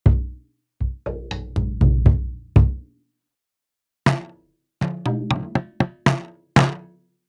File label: Taikos; Impact Soundworks Kageyama